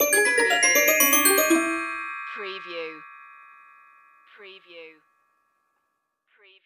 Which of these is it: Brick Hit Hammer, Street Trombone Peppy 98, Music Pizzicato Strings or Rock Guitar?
Music Pizzicato Strings